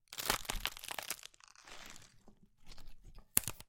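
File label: lettuce leaves sounds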